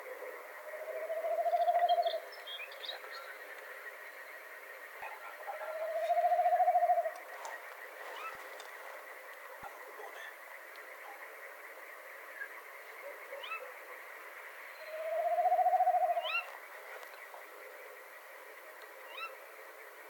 call, tawny owl, Strix aluco, Background: Amphibian calls, human voices and barking dog.; quite far from the microphone, therefore sound amplitude is relatively low.